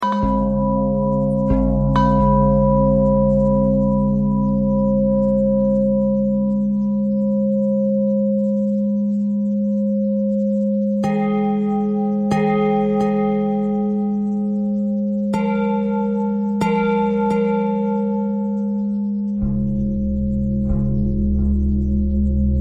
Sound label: bell thread pentatonic